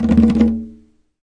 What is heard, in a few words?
arabic perc, Arabic Percussion Kit; ETHNIC and WORLD PERCUSSION; Arabic Percussion Kit 3